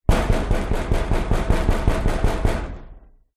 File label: household knocking metal garage wall